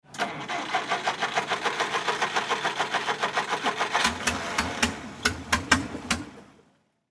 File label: Swamp Jeep Stall; Swamp Jeep Engine; Transportation Watercraft